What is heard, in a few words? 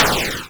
explode, 8-Bit Sounds